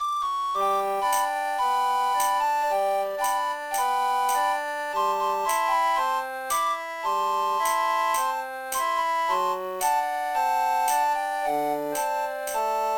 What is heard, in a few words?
Puzzle Complete No Fanfare slower; tetris ds rip